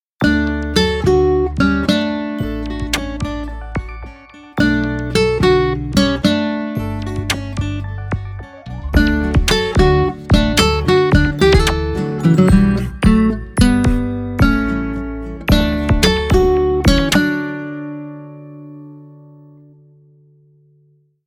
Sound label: Elegant Guitar, ringtones; Infinix; XOS 15